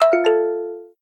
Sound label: Wave, notifications; Lenovo; ZUI 13